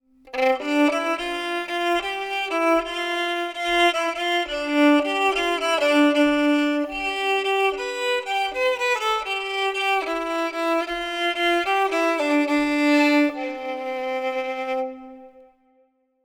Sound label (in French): violon normal reverbe